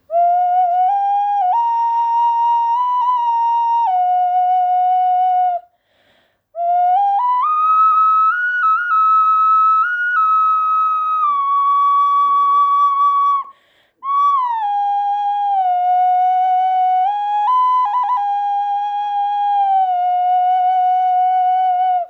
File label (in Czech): okarina velka strom; zverokruh; strom zivota